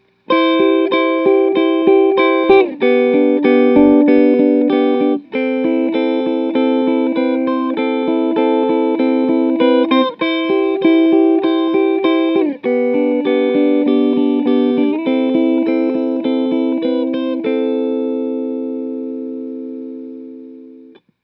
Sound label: Clean